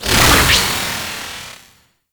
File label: Invisibility Exit; Warframe; Infested Lich